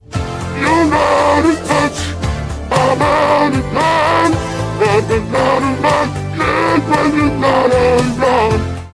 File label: zombie autotune